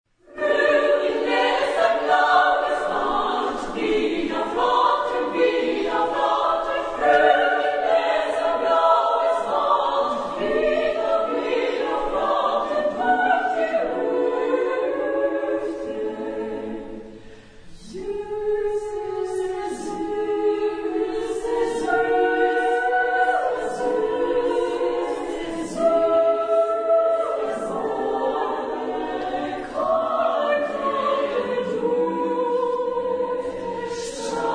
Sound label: female, chorus; Reger; Er ist's Op11